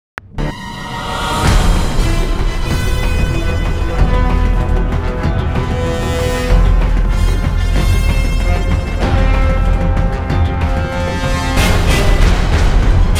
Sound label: music action